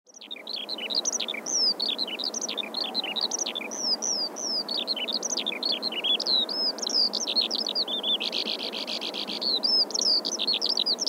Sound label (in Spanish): alondra comun